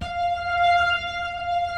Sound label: F, The Piano; InVision Interactive